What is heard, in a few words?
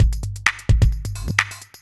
electro kit 130